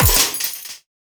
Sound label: fighting game designed destruction impact debris metal object; smash